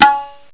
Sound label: TABLA; Octamed 6; walkabout; indian perc